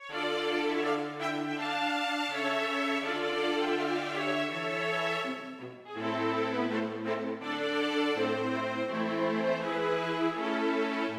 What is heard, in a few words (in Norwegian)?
Gavott for strykekvartett; Hertz